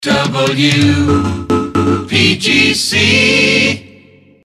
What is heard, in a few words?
jingles resing bump bump bump ba bump; pams series 42a